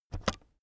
keyboard external space press fast soft

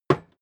hammer wood hard

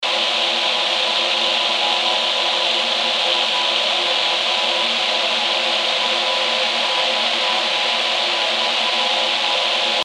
noise

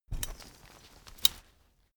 umbrella big close full

